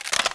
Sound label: m60 reload open